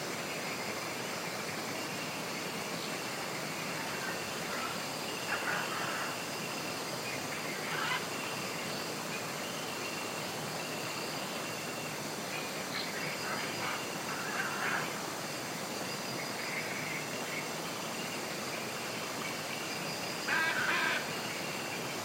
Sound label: Ambience Bird paradise; angry birds rio